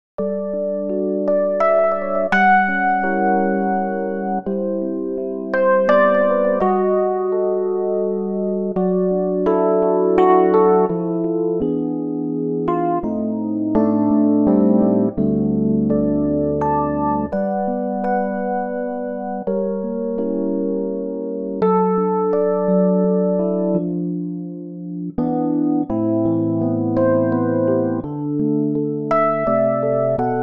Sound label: G 3/4 electric piano; key - G